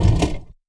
P-51D Cockpit Canopy Open End; DCS

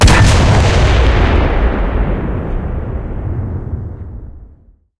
cannon 150mm type38 shot

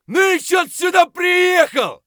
fight; Escape from Tarkov

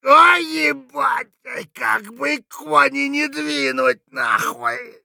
hurt light; Escape from Tarkov

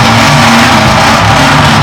Vehicle Engines; Red Orchestra 41-45

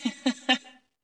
arm laugh

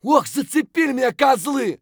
hurt light; Escape from Tarkov; scav2

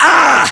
pain; ModernWarfare; localized english

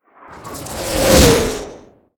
artillery incoming; Explosions

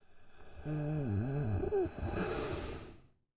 sleeping; Rust

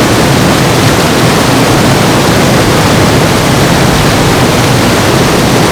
waterfall open canyon 3d

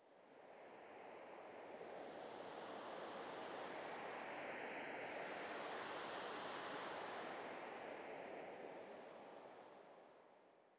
sting gentle wind; Rust

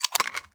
eject clip; Rust

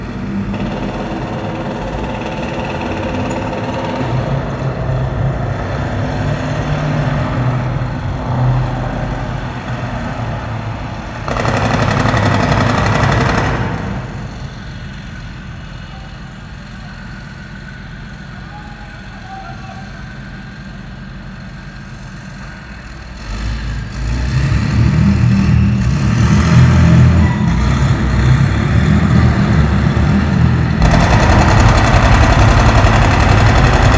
Amb Destruction; Red Orchestra Ostfront 41-45

